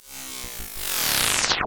Power Down Rpg

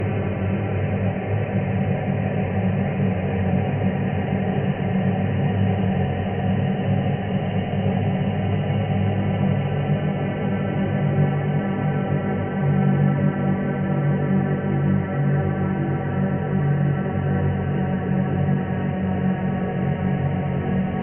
Horror Ambience

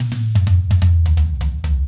Tom Attack

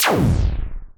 Laser Classic Shot

